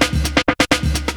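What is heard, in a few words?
LOOP snare drum, Drum & Bass, 130 BPM; Ueberschall; Drum & Bass Resonance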